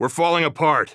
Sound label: scared emote; vo; Counter Strike Model Pack; CS:GO; phoenix coop